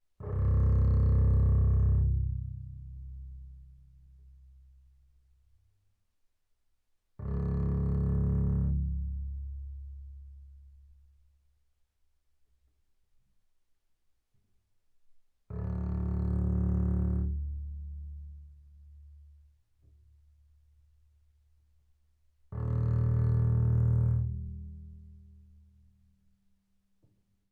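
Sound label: Bass arco sul C fortissimo C1 Eb1, double bass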